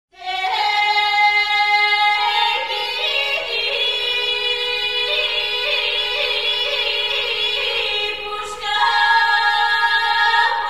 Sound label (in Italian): Voci Bulgare; Cori Polifonici